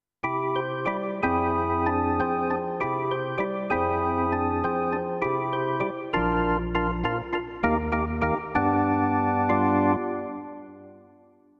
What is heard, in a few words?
Drawbar Org